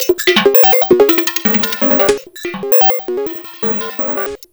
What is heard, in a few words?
HAIR; USB Soundscan vol.33; AKAI; Disjointed Textures; RYTHME